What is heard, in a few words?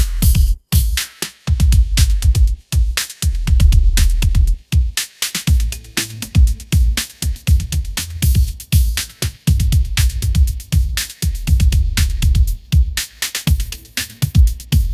upbeat pop melody; ai